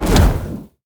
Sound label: dragons attack; sfx; murglun